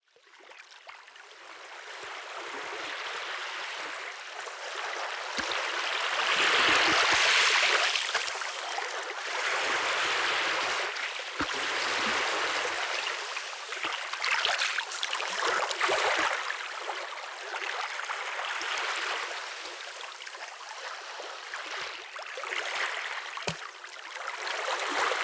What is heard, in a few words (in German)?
Meeresrauschen